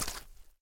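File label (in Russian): всплеск от рыбы на рыбалке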